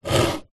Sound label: foley wooden box small empty slide smooth concrete screed